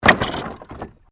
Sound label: Wood Movement Heavy; Material components for constructing more complex sounds; Materials